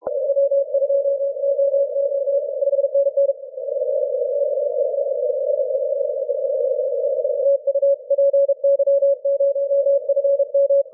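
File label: 12 continuous wave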